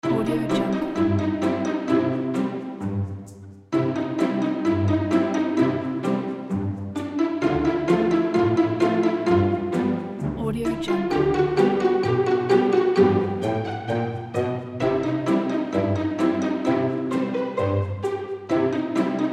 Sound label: sitcom comedy loop